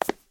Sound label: marble step; Golden Crayon Sounds